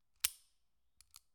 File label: Revolver Miss Fire